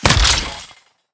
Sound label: pen arrow